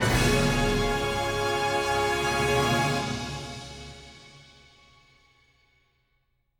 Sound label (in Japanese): クリアファンファーレ